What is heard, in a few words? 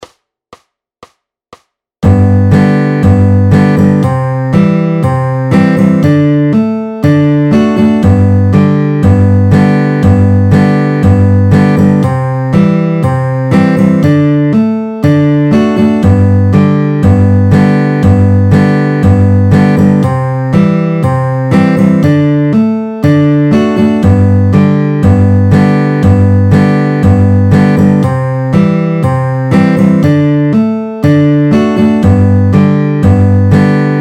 FAST, fingerpicking